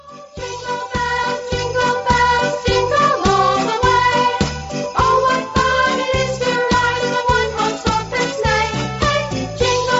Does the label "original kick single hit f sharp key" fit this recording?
No